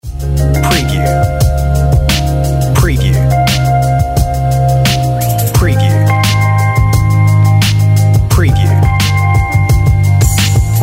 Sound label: On Hold Music